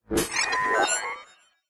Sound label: science fiction robot malfunction glitch